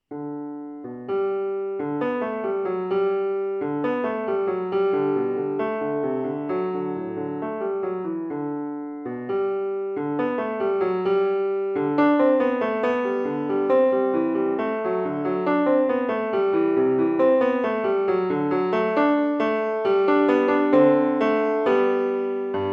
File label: Allegro Energico